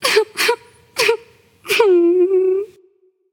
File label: boo hoo; Smite; bastet